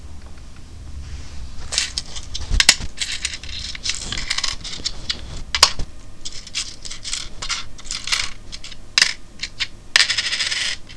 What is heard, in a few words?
coins